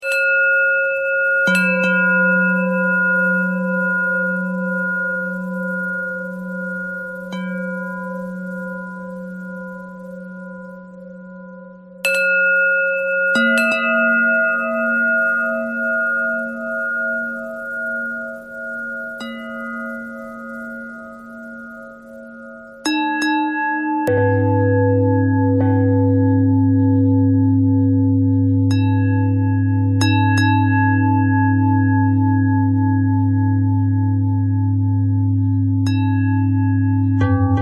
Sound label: bell thread bend thread